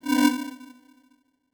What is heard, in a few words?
lose sound